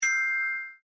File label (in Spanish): campanilla